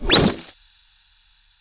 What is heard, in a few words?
Jump down; Go, Kizi, Go!